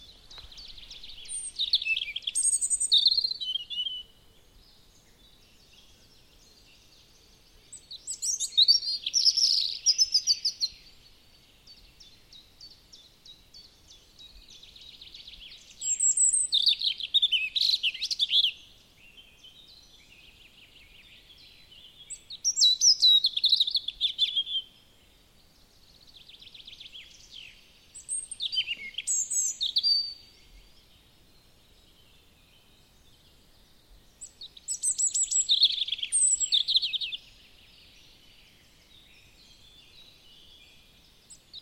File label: Erithacus rubecula; SETE